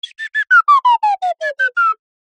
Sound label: cartoon slide whistle descend stutter slow